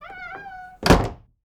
close door

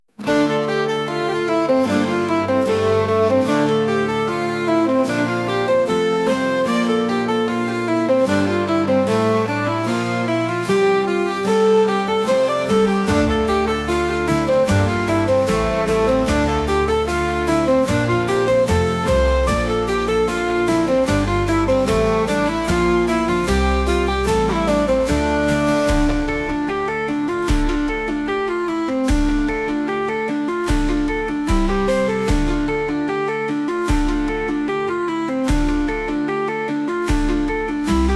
calm

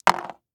die roll, dice

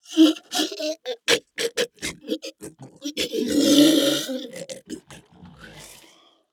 zombie skeleton die

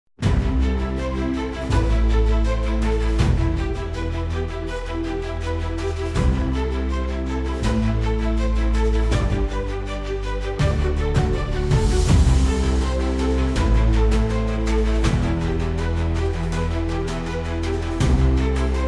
calm

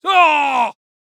die sound

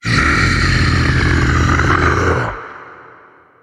ogre

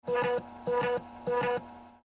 save to disk; effects

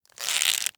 bow; weapons

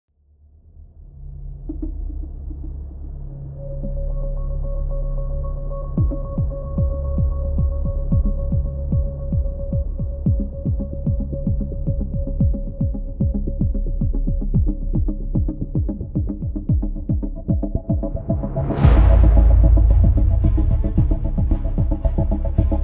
combat

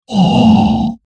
hit, troll

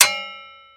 sword strike; weapons

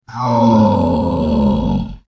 pain, troll

